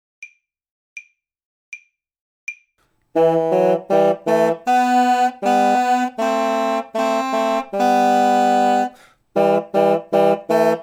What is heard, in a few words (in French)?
promenade, bassons volants duos